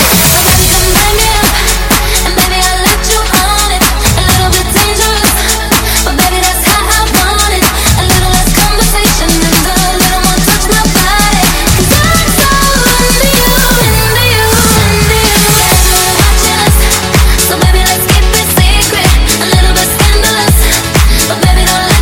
126bpm Fm

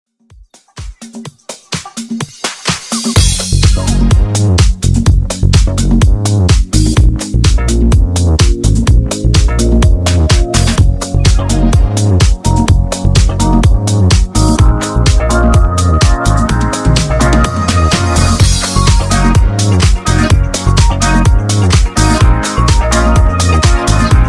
Funk 126 Bpm

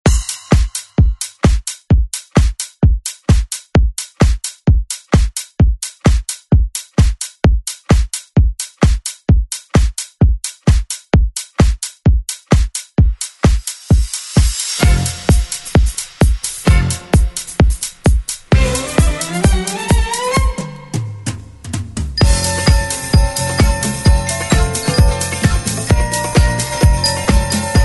70s ReDrum; DJ Mhark

